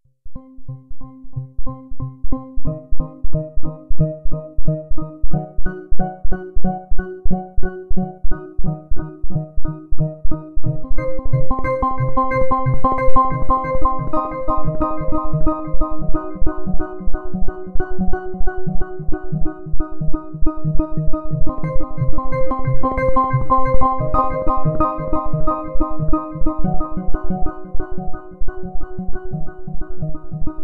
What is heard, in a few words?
staccato, chiptunes